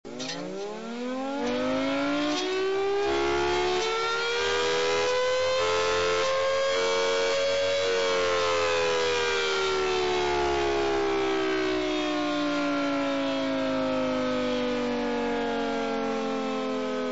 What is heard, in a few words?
curfew, sirens; montello